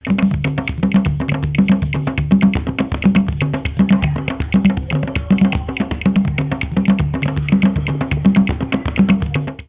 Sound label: ringer; snom3xx; Ringtones